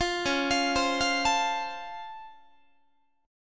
Db Augmented Major 7th, down, Guitar; arpeggiated; JGuitar

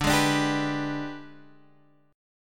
Ab Minor Major 7th Flat 5th

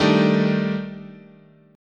D sharp Major 7th Flat 5th, chord

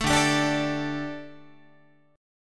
D-Suspended 4th Guitar, Dsus4 chord; strummed

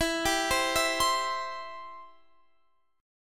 B sharp Major E

